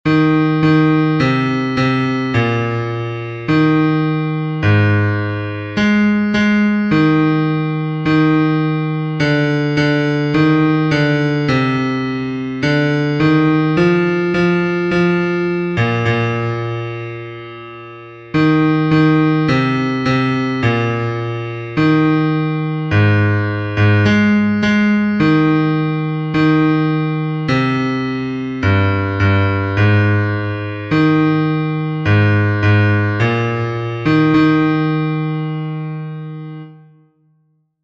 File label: Bass